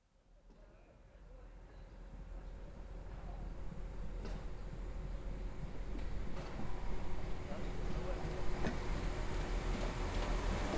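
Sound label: train passing left to right with wheeled suitcase follow up right to left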